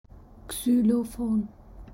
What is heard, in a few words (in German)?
Xylophon